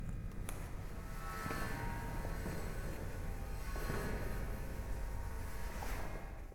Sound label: Going Downstairs Passing A Hand Over A Metal Bar